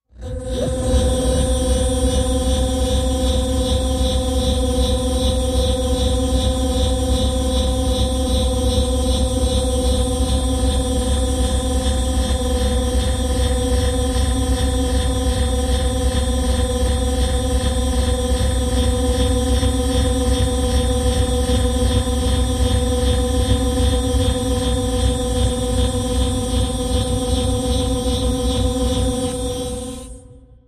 Driven Generator, Machine, Electrical Pulse, High Grinding Drone; power core hum